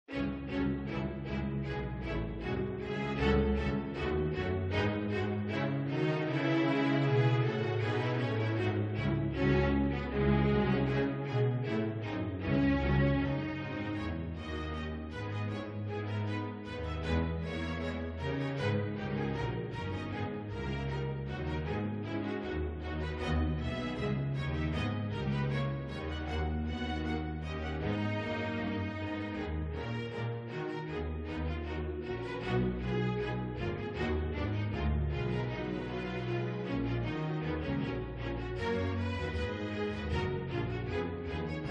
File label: Sonata for string orchestra; Lennart Ostman; Auto composed by YouCompose